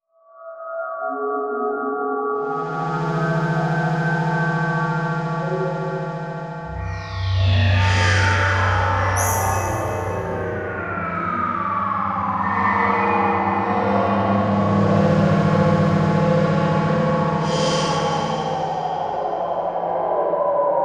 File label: Electronic Whoosh Reverse Feedback Digital